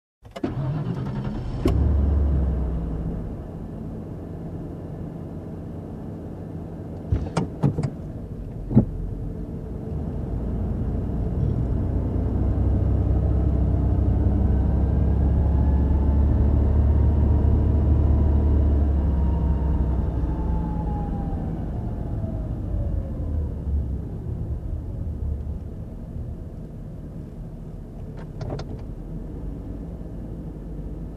Driving in car

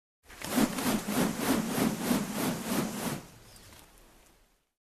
Pulling fast sound

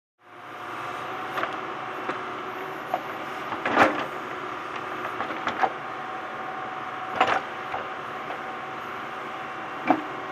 Construction Building

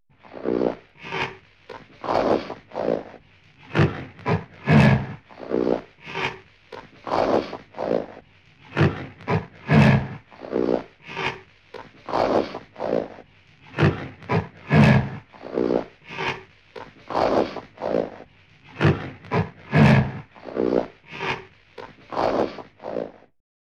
Silverback gorilla grunting menacingly

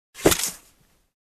Stack of clothes slam

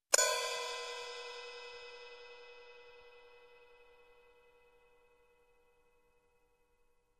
Cymbal Soft Hit

